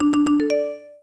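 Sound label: stutter, Variations on a ringtone; tri-tone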